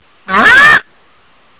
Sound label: wrawk